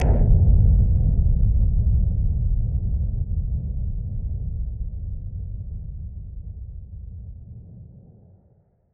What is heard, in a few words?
Noise Bomb Boom Filter; Noise Bombs; musicradar; bomb factory